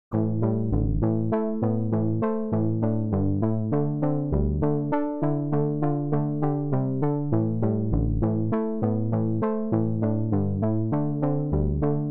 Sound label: Two Chord Piano; Tverb; RePro-1; Trix